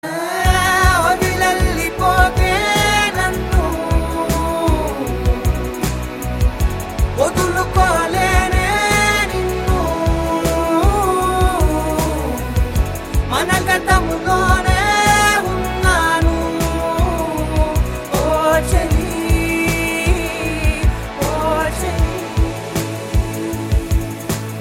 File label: Alone Alone BGM; Malli Modalaindi